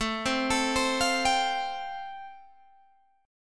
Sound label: A-Minor 7th Sharp 5th, Guitar Open G, chord